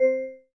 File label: Class Harp, notifications; Oppo Find X5